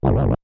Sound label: Boss Hurt; Dungeon boss getting hit